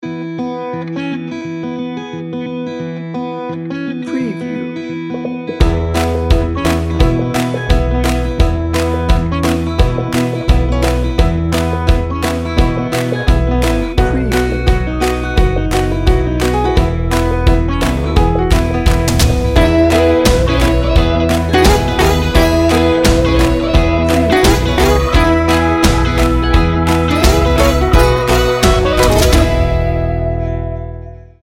folk electric

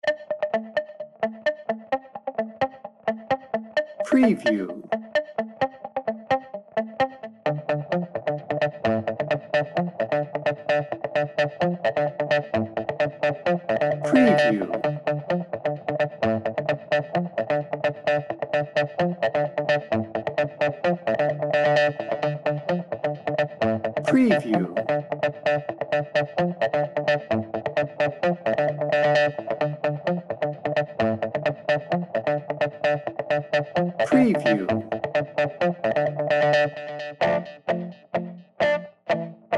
whole lotta perky guitar only; Cheerful, lively Latin track. Featuring punchy horns, sensuous piano with sax and trumpet

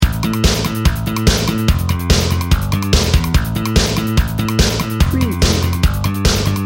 cardio crunch loop

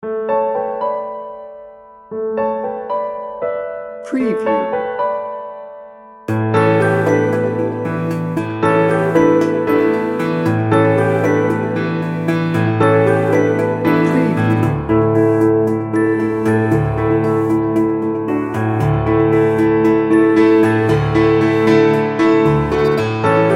breezy summer day

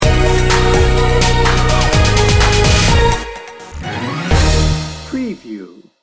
ghetto gangsta sting bumper

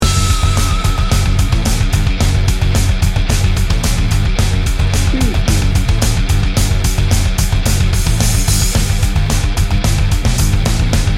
metal mayhem

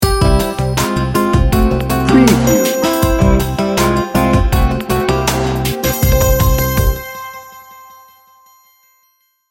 free and easy sting bumper

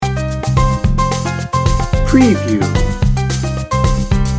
salsa loop

